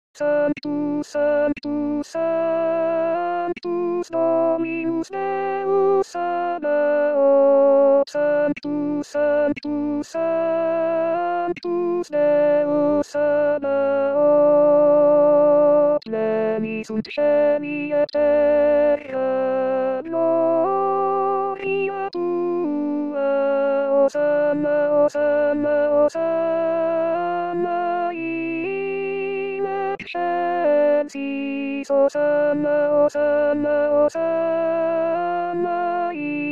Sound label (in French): sanctus alto; st jean